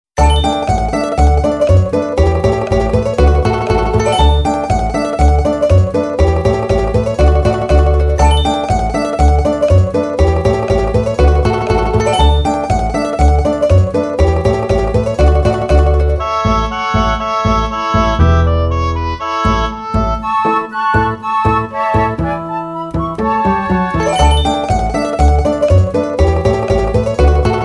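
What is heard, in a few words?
folk calm, Russian folk music; narodnaya spokoinaya; muzyka dlya prazdnika v dou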